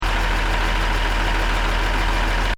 Engine: Tick Over Revs Loop, car; Diesel; Cars; Vehicles; Stuart Duffield

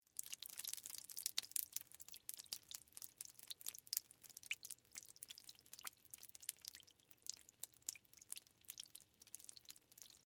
Water Drips; Water (Sound Effects:Nature); BlastWave

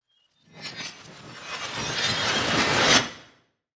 Crescendo Suction, Reverse, Glass; BlastWave

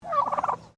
Bird Turkey Domestic Call; Birds; Animals; SoundEffects-Foundation1

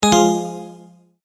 multimedia tone error; Tones; Sound Design; McKinney Sound

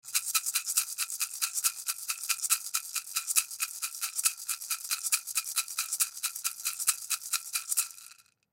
Maraca Shake, Maraca Shake Multiple Fast; Hit; Blastwave

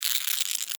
Insect Move Click, Insect, Movement, Clicks, Bug; Sound Effects:Animals:Insects; Blastwave

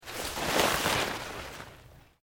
Plant Leaves Large Move Slow; Material components for constructing more complex sounds; Rustling

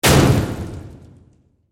Hit Slam Impact, Reverb; Production Element, Imaging Element, Accent, Transition

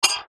BEEP TONE SIGNAL; Multimedia; Beeps

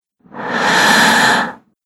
Female Breath Inhale; Gasping Sound Effects

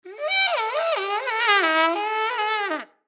Cartoon Trumpet Muted Warble Musical; Cartoons; Blastwave

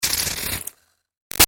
Sizzle Iron Electrical Zap; Electronics; Zaps; Big Room Sound

